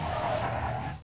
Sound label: dust skid; madcars2; radicalplay